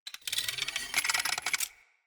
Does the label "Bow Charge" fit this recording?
Yes